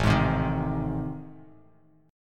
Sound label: A Minor Major 7th Flat 5th, Bass Standard; strummed; AmM7b5; JGuitar